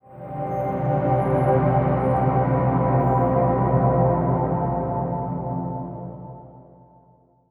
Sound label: Stinger; Crystalsmith Kangor